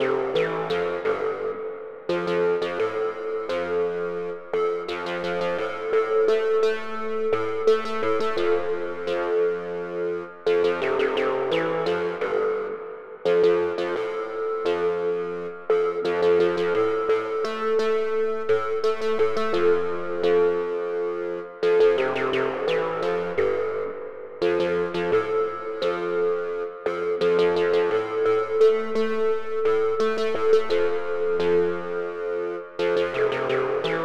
MS-20 HaloVerb Hall 1 Wet 56; Metric Halo v4

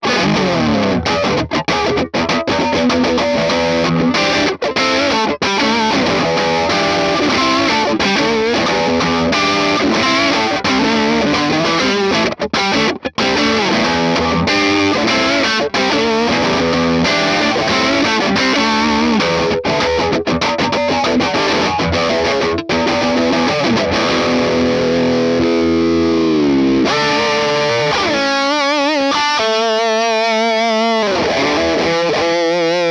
Morgan Amps Dirty Coil Rhythm AC20

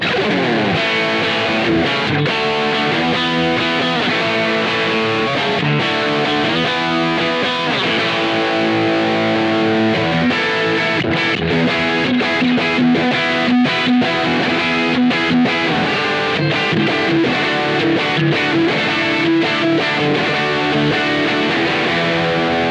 Fender Gold Foil Jazzmaster Highgain dry

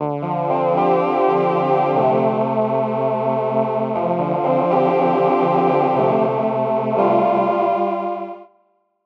Cherry Audio PS-3300 Vox; Keymana

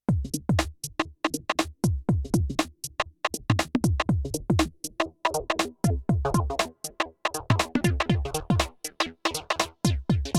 Korg drumlogue; Patch 3 The Logues

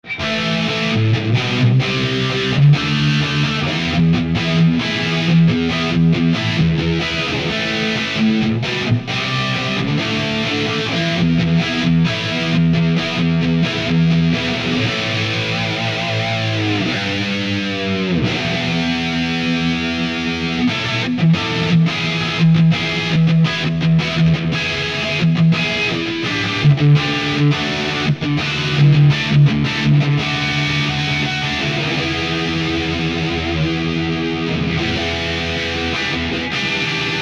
ToneX One Soldano SLO 100 Highgain Board; IK Multimedia

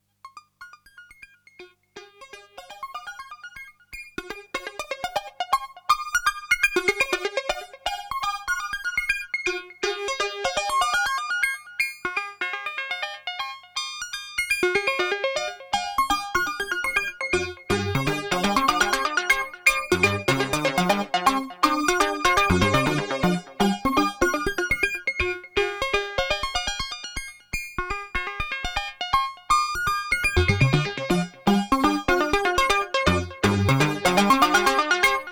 Intellijel Cascadia Arp Burst

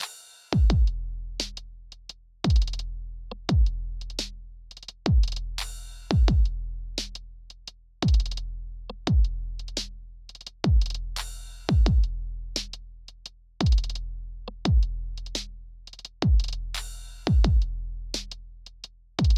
SonicEQ Amp Cleaner; Metric Halo v4; Drumazone2

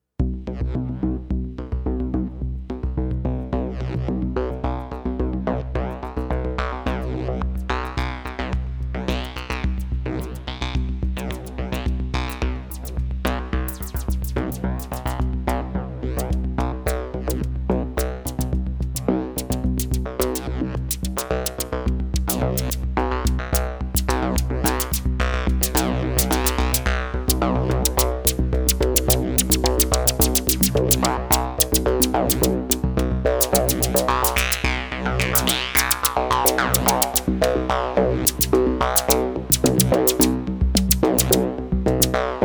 Moog Labyrinth Sequence

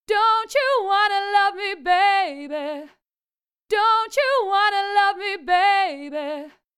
NTS-1 mkII Vocals Original

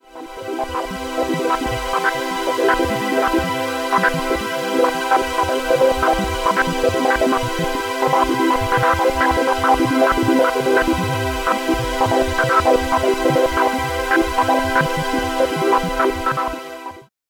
Clean Boost Filter Pad Resonance; Elektron Analog Heat

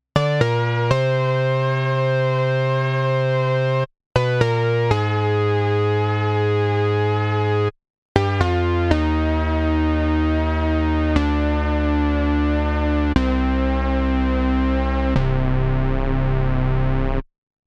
Kobol Laserharp VCOs LFO

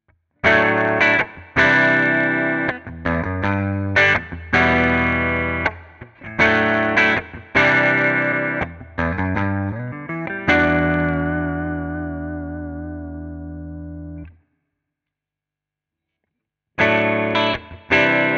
Tele Deluxe Clean; Fender Vintera II

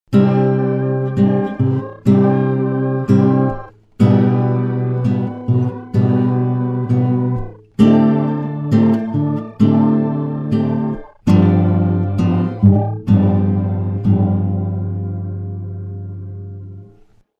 Granular Tape; EFX Refract

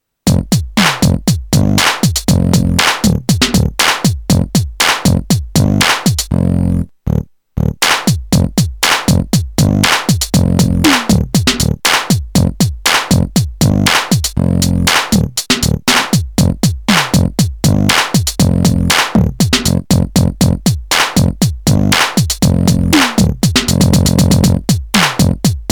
Beat Live Performance

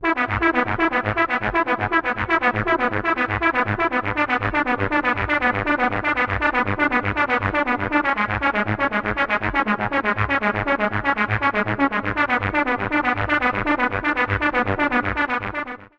VSM IV Roland RS202 Sequence; GForce

